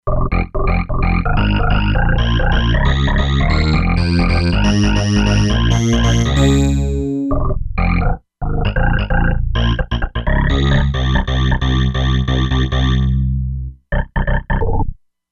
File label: Turbo Bass; Factory Presets; E-mu Proteus-2